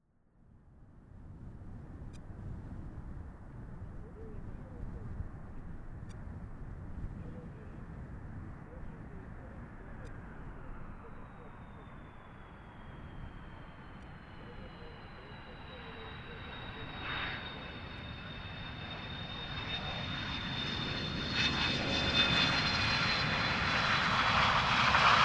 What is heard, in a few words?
Airbus A320 aircraft landing on the runway